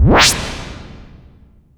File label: Harsh Back Zap C3; Club Techno